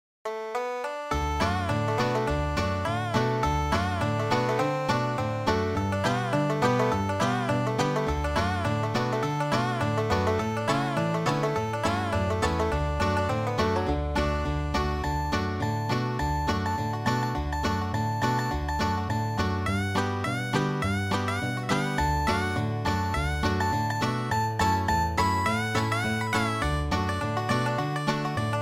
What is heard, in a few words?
tab banjo riff